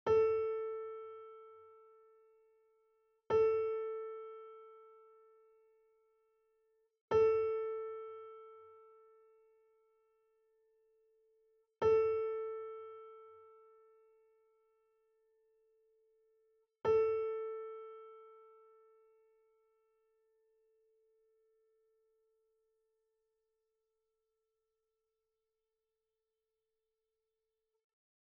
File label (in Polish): A 442 Strojenie